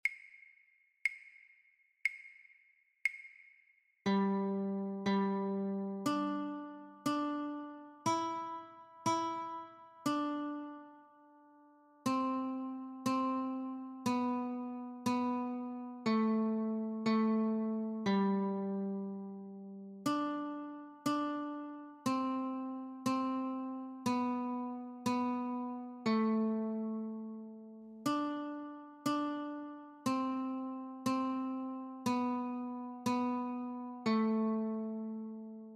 Notes Only, Guitar

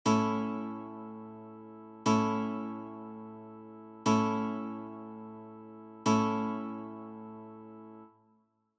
A Chord, guitar; childrens

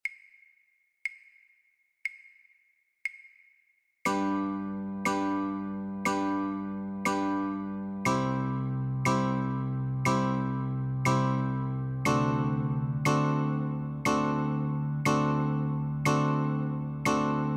Baa Baa Black Sheep Chords Only, guitar; childrens

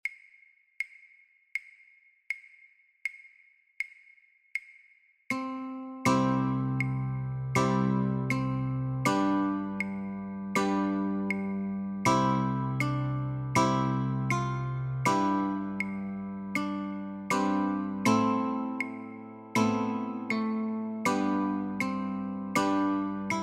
Notes & Chords, Guitar; christmas

